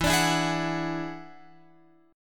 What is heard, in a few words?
E 9th E down Guitar Open F; E9 strummed